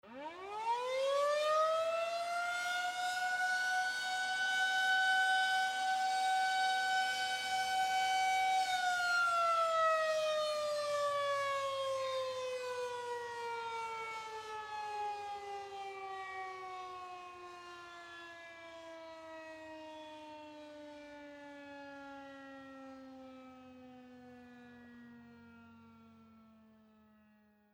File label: Air raid sirens